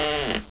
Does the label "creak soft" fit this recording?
Yes